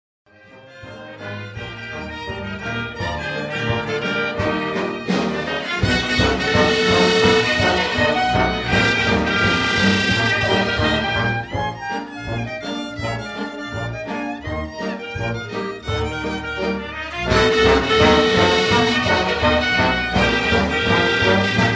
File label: Pine Apple Rag